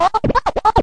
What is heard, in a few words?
scratch these 32nds; Scratching and Turntablism